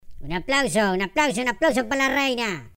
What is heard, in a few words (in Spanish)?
aplauso